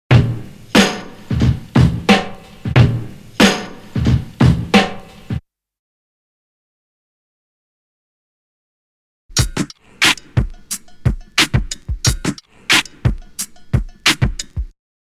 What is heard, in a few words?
Drum loop; BEST OF DRUM BREAKS 30